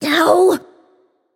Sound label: ouch; discordia